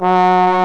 WINDS & BRASS

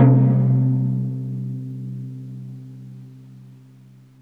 TIMPANI C3

